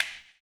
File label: CLP, CLAP; ILIO - Ethno Techno